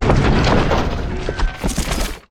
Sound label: space platform tile build, factorio sound terrain